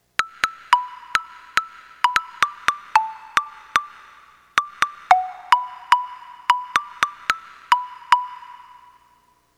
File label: Korg EK 50 L Ultra Popping